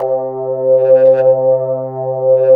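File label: synth, 150 BPM, drum & bass; Ueberschall; Drum & Bass Resonance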